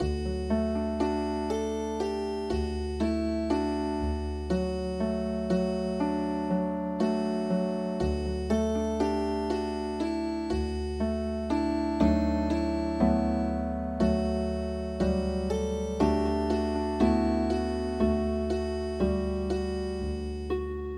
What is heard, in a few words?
turkish fishermans song Healing Harp